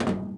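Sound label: trapdoor run, footsteps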